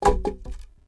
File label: club hit concrete; objects; Star Wars Obi-Wan; XBOX